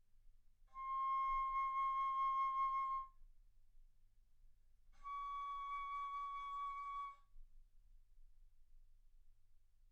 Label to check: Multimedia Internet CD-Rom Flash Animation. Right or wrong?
Wrong